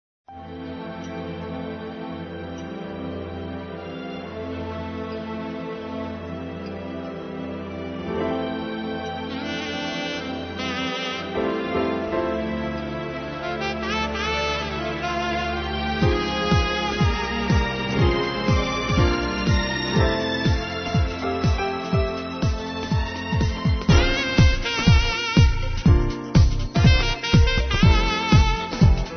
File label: sax disco